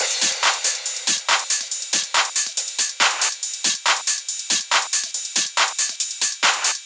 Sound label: drum Loop 140BPM Full